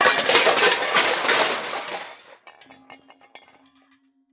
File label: Impact Metal Hit Cement Pop Cans; Impacts